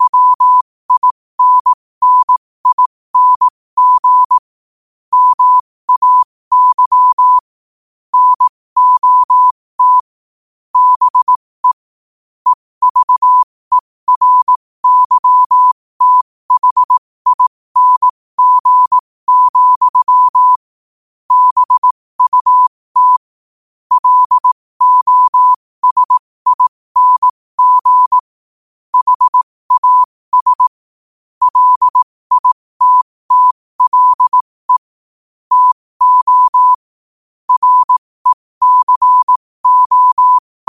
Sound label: 5 wpm, morse